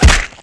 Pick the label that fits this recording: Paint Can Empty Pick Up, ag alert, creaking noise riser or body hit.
body hit